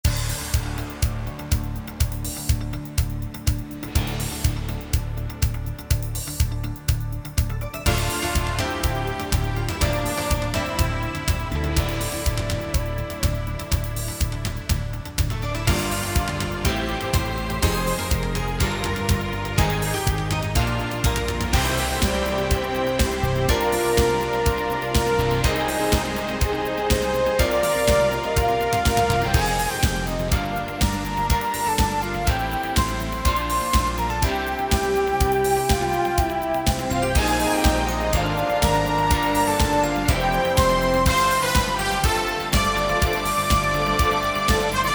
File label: bgm